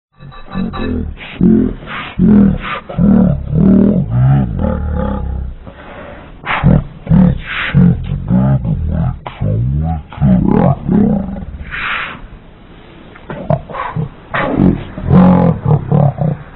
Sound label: chicken; vapesquared